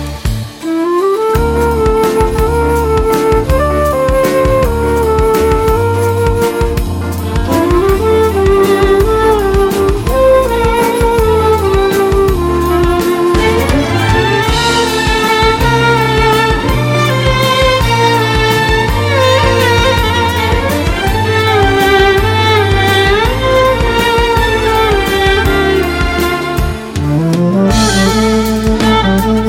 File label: Violin